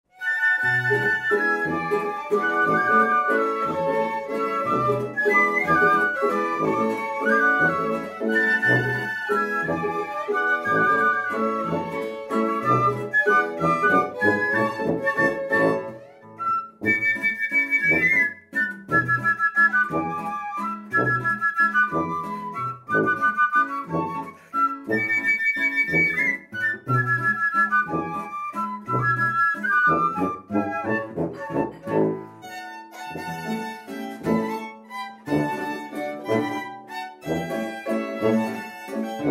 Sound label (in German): Walzer